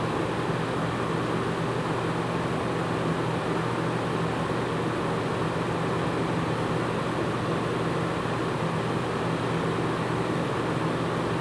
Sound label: Fan Noise